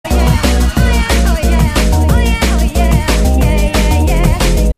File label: Drum and Bass Jungle, UK Bass Radio